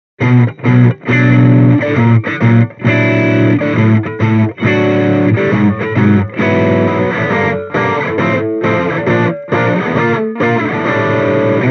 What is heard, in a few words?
Mini Rox Mic Inside CANNABIS REX Overdrive Les Paul